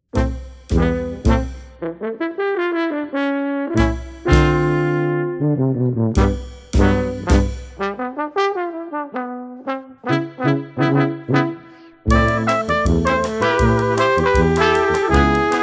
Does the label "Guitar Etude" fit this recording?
Yes